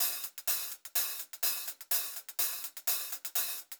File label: 126 HH, Pop-Rock Drumloops; USB Soundscan vol.37; AKAI